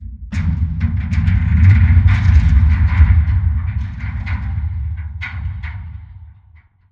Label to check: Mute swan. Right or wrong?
Wrong